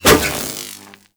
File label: Prod Whoosh; Warframe; The New War; Drifter